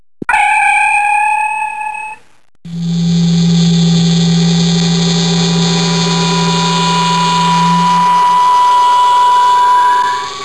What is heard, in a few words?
beam out; Star Trek